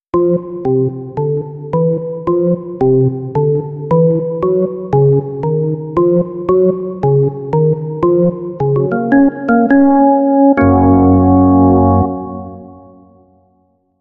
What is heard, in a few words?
Organ Teasers